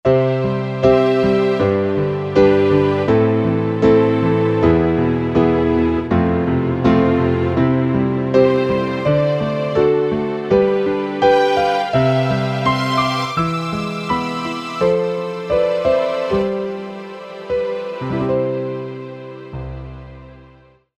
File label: Piano + Str., Roland SC-880; Factory Tones